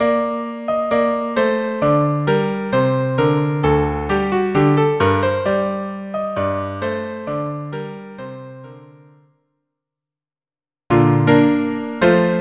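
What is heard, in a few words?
Menuet Rigaudon viola cello; Almira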